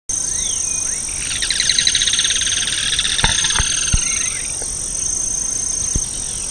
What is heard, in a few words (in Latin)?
Certhiaxis cinnamomeus; Virocay; Jorge La Grotteria